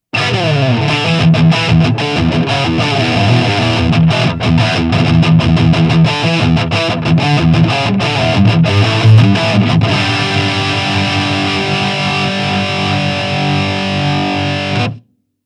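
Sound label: Heavy Crunch Shure SM57 Celestion 65 Watt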